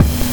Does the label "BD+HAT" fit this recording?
Yes